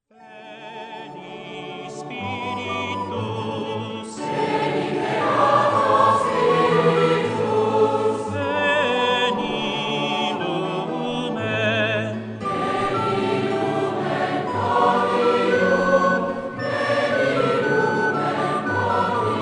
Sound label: Veni Lumen choral